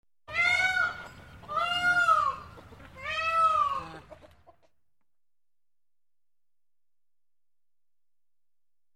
Fowl crying

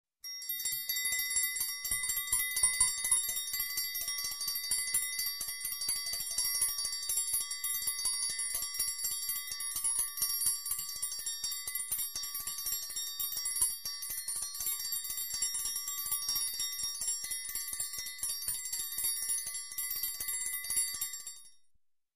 Triangle ringing

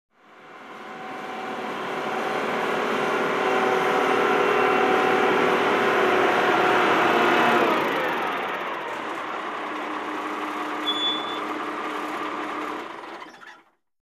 Construction

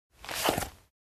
Pulling out gun from holster slowly

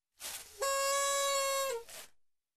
Mini Horn

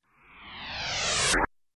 Short Sci-Fi Synth Riser

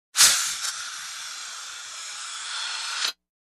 Tire Inflation

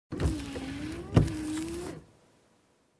Windshield Wipers once

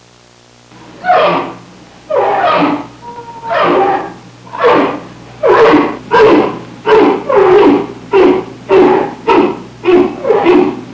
Orangutan

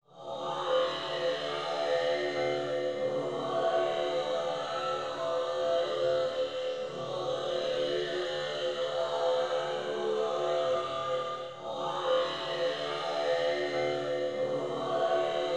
Heavily synthesized chanting

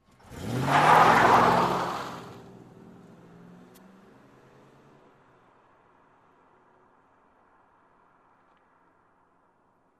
Fast Driveaway

